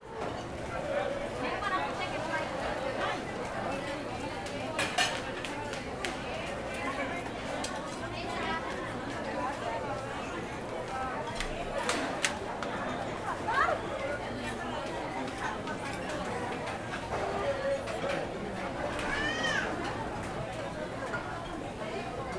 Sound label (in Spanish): Mercado Comidas